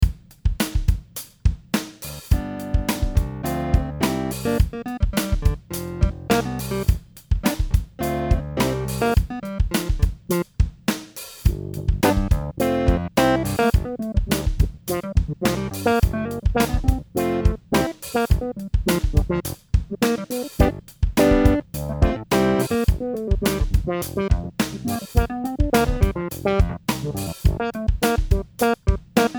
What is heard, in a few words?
Clavinet Auto Wah; Acidbox